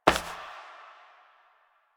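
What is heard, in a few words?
Step Glass, footsteps; Warframe; Koumei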